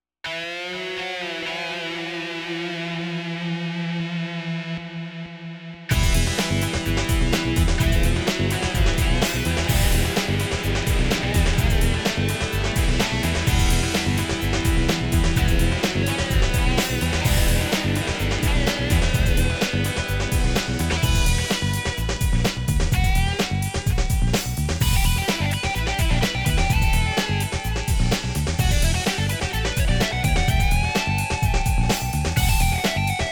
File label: Body Pumping